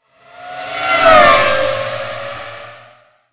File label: FERRARI; Ferrari 3400